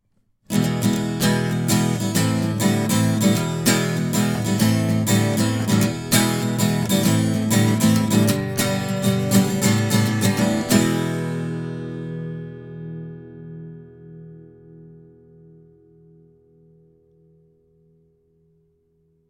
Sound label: acc gtr rec